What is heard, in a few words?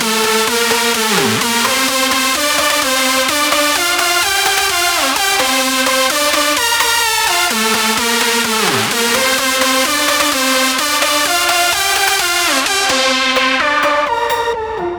EDM Melody loop 128 BPM G